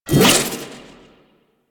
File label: Crack Retract; Warframe; Warframes; Khora